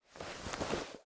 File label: weapon holster